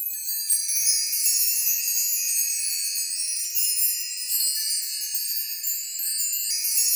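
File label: MARK TREE RANDOM; InVision Interactive; Lightware VOL-1; Instruments & Percussions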